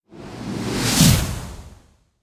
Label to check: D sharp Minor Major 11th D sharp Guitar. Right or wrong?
Wrong